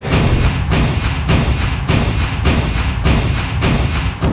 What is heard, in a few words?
defense Into Offense